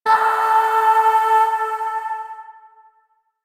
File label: Void Shawzin Oct Two A; Warframe; Zariman